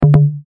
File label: ui cancel